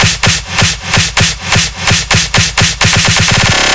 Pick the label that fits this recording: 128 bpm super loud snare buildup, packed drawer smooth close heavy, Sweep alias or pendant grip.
128 bpm super loud snare buildup